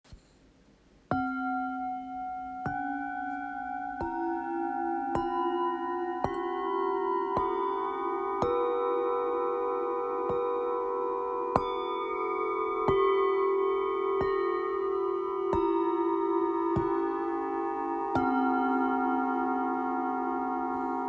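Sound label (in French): Set, bols chantants tibétains; Cosmos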